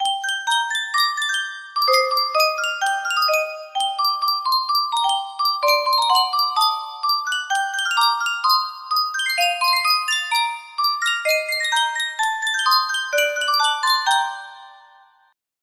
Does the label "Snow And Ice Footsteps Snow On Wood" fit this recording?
No